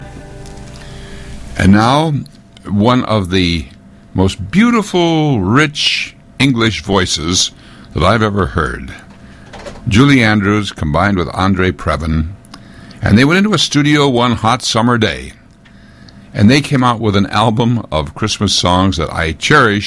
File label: Bells of Christmas